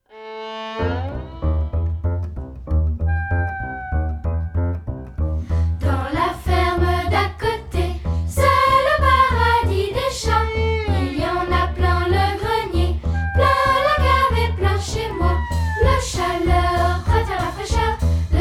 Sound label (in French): La polka des chats Version vocale